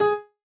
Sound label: chorus G#5